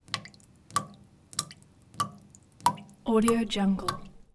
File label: kitchen sink drips metal sink drops leak faucet